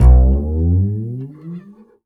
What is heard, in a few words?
Upright Acoustic Bass, 1ST POSITION; Larry Seyer